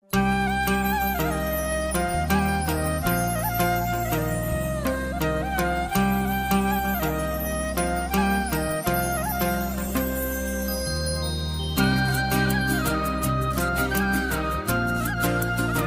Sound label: flute ringtone